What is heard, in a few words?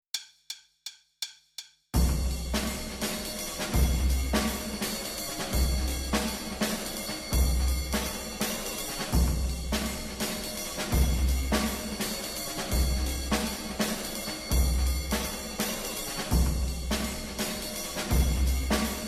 Drums